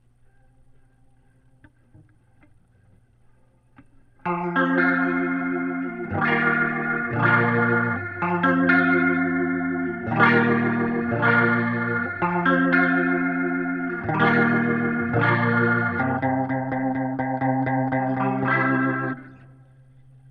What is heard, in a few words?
Leslie gtr